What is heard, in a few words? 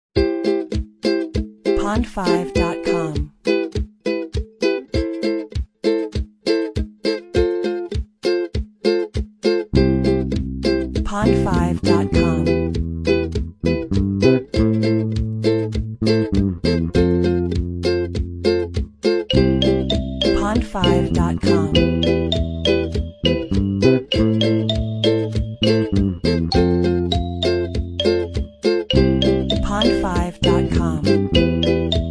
island love ukulele